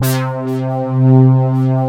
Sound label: ATTACK PULSE, TECHNO; Pro-Rec - Synthsonic; Z1 TECHNO